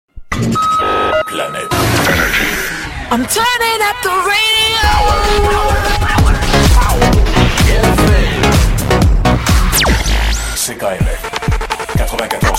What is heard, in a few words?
Ringer